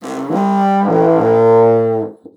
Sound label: HUBU, BASSOON; Xsample VOL-6